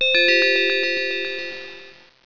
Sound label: quick Tinkle; alertViz